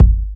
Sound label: original bass drum one shot g sharp key